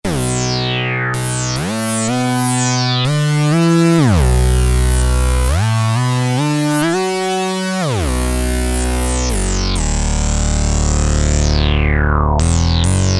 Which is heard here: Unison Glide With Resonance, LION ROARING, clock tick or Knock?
Unison Glide With Resonance